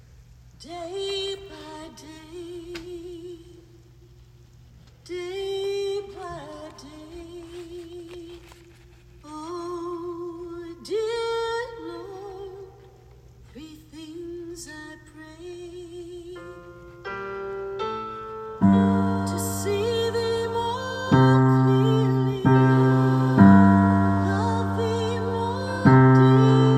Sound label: BASS